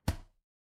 impact slam

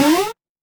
retro game jump

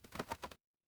animal wing flap

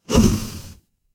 cartoon poof

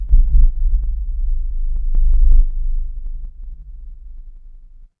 fire electric hum

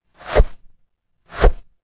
transition swoosh

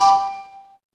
feedback success

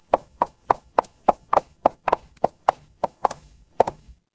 footsteps heels

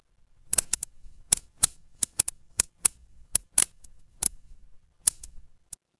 fire electric crackle

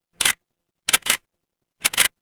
office camera

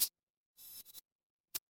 retro game coin

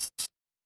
retro game coin

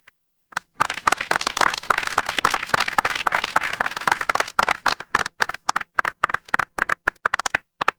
crowd applause

